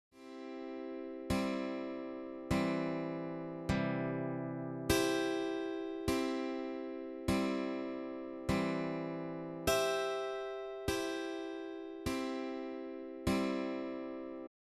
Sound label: triads practice, guitar